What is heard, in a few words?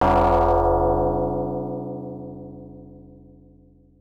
Player 1 Coin; Xybots; arcade